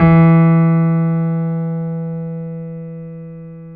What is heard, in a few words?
BOSENDORF